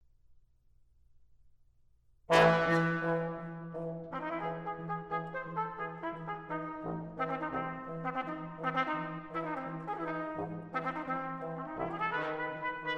Brass Quintet Fast and rhythmic

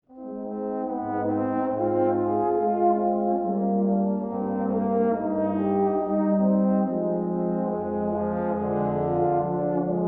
Concerto in E Flat Major, Largo

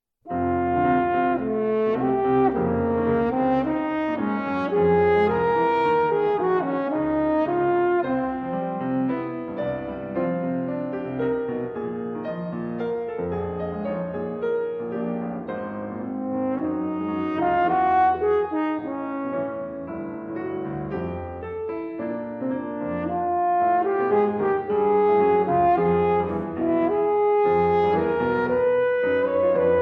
Sonata for Horn in F and Piano; Mvt. 1. Ma ig bewegt

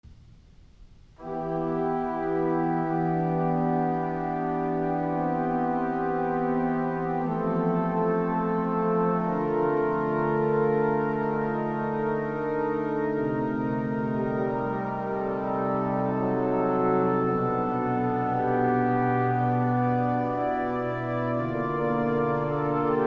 Preludes, in e minor, Brass; Short Preludes For Organ - VIII. No. 28

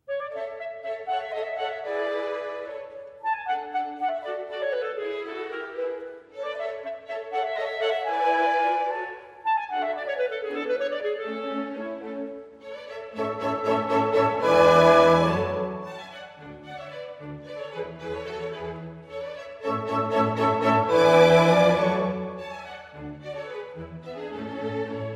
Clarinet Concerto in A Major, Rondo; Allegro; Mozart

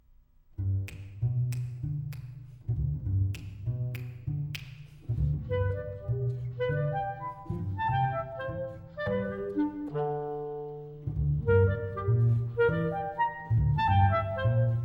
Clarinet and Double Bass - 8. Jaunty

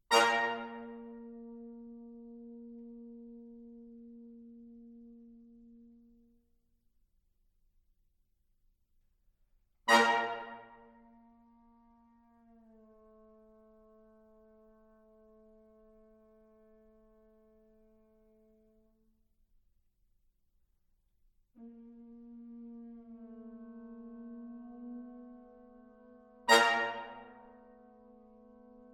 Brass Quintet - Slowly; Tentatively

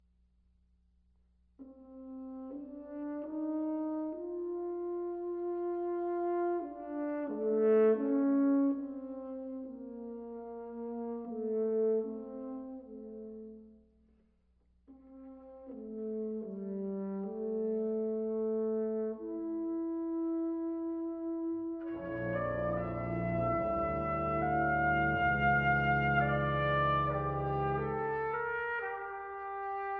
Concertino for Brass Choir - Mvt. 2. Slow

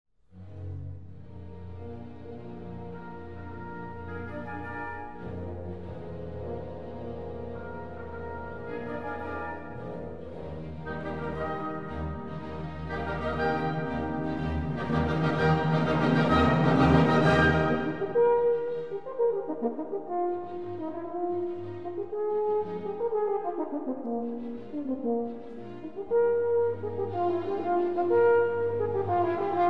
Horn Concerto, Allegro; Strauss Concertos

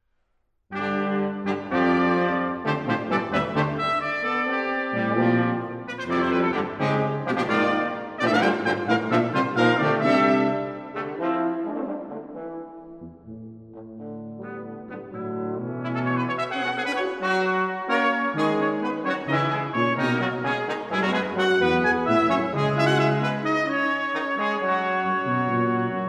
Brass Quintet, Quarter Note=132; Art Collection music of Arthur Frackenp

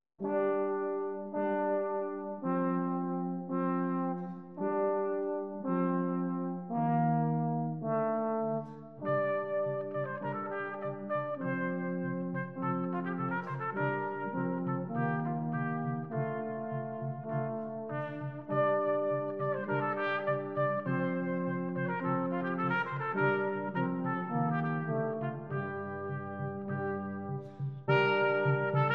Basse Dance; 'La Brosse'

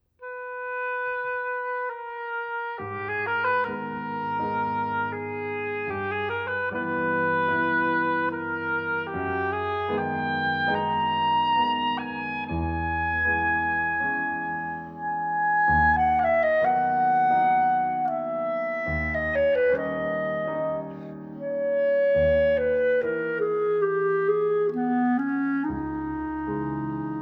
for Oboe Clarinet and Piano

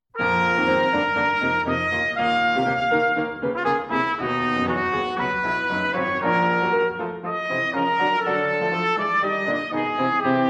Sonata for Trumpet and Piano; Mvt. 1. Mit Kraft

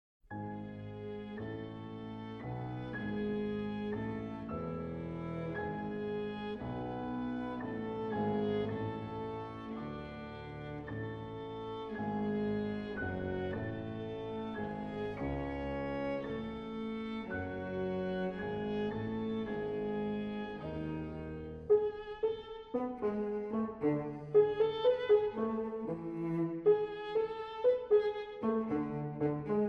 Piano Trio - Mvt. 1. Allegro Ma Non Troppo

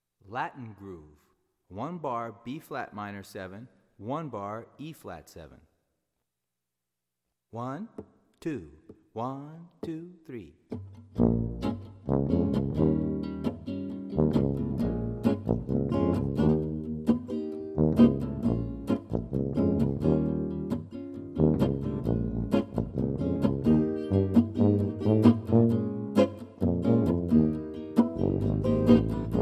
Latin Groove Bbm7 Eb7

